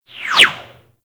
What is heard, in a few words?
Skiff Bullet By; Grineer; Arch Skiff